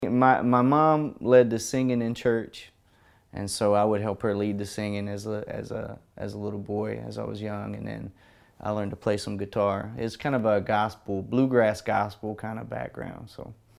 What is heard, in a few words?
Church Music